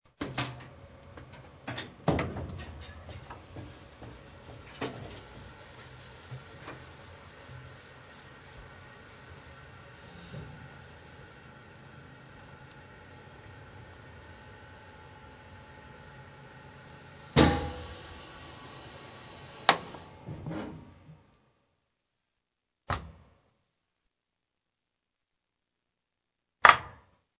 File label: periscope